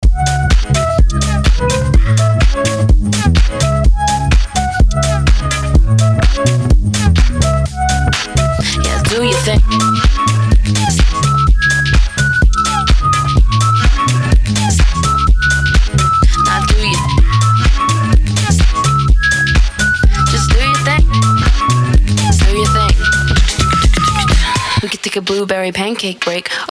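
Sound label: club flute; klubnaya flejta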